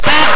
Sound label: zoom out; Nintendo; July 2020 Gigaleak